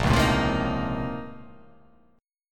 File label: Bb Minor Major 11th Bb; BbmM11; strummed; 6,4,7,5,5,5,5,x; JGuitar